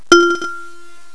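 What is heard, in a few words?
XYLOPHONE NOTE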